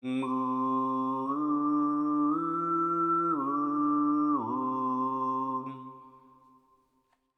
Eighth overtone in parallel among C D E fundamentals; ottavo armonico in parallelo su fondamentali